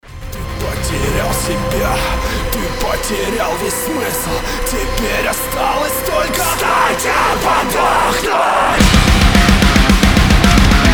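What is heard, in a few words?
alarm clock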